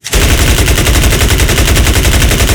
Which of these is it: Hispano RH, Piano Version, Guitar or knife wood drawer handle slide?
Hispano RH